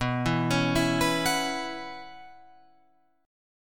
B Suspended 2nd Suspended 4th B Guitar Open F, chord; arpeggiated; 11,11,13,11,14,13